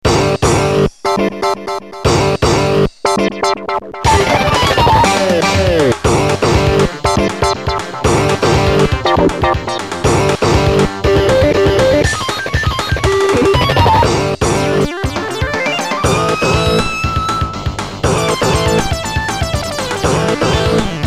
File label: Guitar; Yamaha SMAF demo ringtones